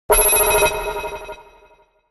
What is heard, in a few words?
alert signal; HTC Touch Diamond